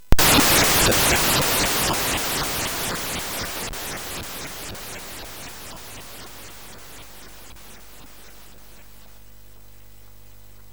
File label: Frantic, MOS6581R4